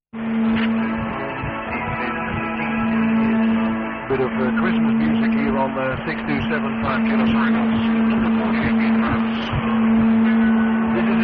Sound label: AM 6275,7 kHz